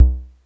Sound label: polyvox bassdrum; Formanta Radio Plant